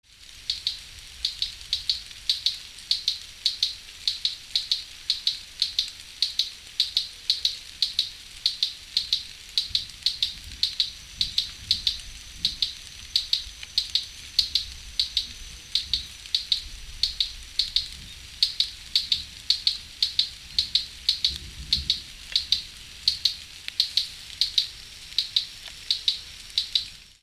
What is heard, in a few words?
Chloroceryle americana